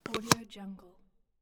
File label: large plastic building brick toy merge; stormwaveaudio